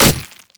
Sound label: monkey king basic attack; LoL